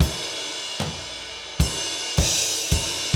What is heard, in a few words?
DOWN KIT 78; AMG Gota Yashiki Groove Activator